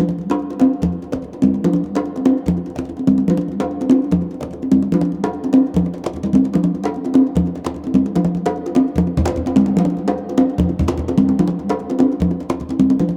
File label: CONGA BEAT; Zero G - Ethnic; CONGA BEATS